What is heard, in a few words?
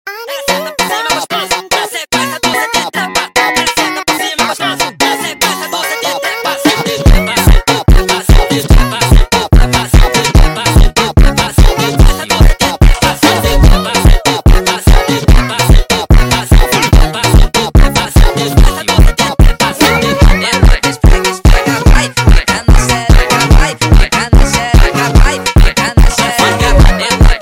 New Soul Funk sped Up